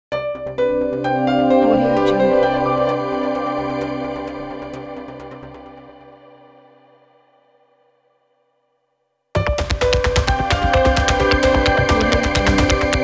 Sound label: corporate logo